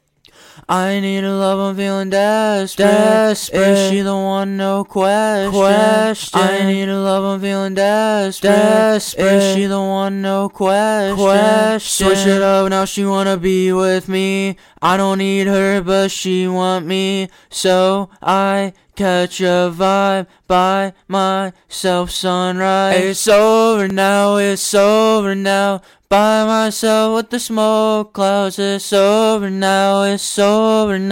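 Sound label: Pop, 170, G major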